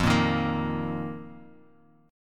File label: F 7th Suspended 4th F down; strummed; JGuitar